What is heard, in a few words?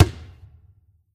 Special Basketball Bounce